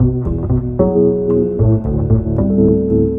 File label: bass, Loops